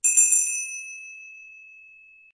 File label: bell